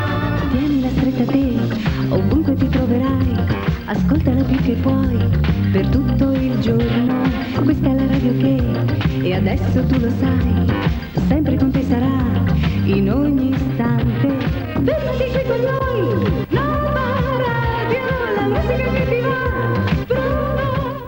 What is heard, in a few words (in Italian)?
jingle cantato; Novaradio